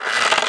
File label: Atk Bow